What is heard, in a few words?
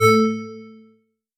elevator tone; chat notification